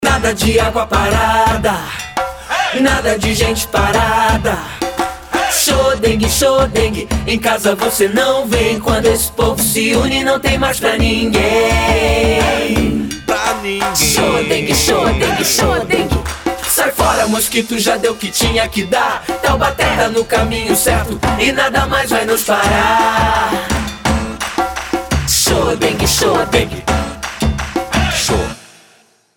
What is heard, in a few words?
jingle dengue